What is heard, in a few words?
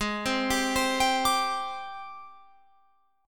Ab Major Sharp 11th Ab, Guitar Open F; AbM#11 arpeggiated; 20,19,19,19,22,21